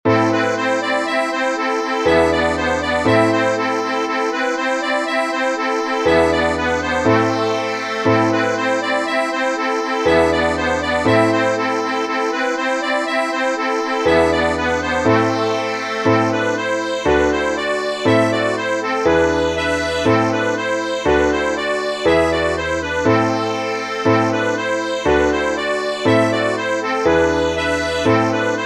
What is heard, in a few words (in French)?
Basque Arin Alboka 2 voix